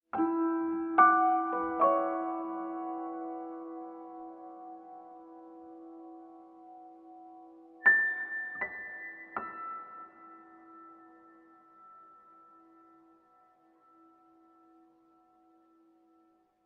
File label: tune